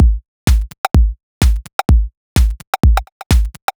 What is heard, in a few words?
Pacapaca 127bpm, House Drumloops; 90 s Sample CDs; Zero-G House Fabrik Drumloops; ZG Drumloops